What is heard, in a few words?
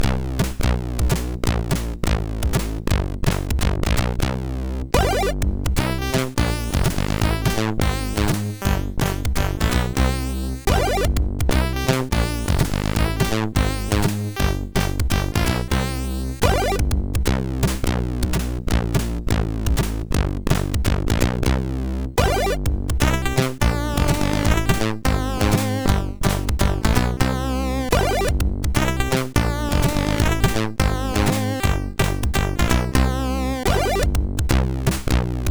What a Jazz, MOS6581R3; hvsc